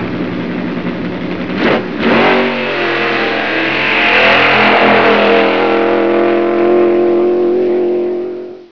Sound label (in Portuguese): CARRO, EFEITOS